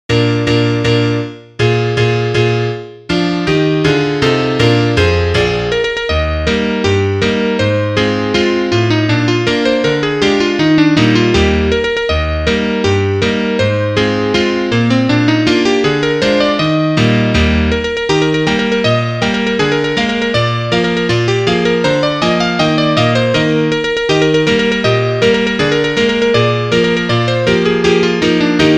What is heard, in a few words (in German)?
Klavier Solo, trompete; Scherzo